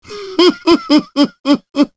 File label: telekinesis charge; frieza